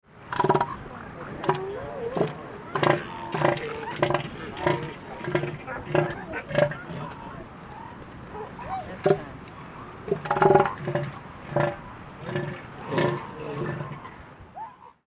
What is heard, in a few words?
Elephant Seal